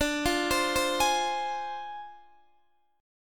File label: D Minor 7th Flat 5th Guitar DADGAD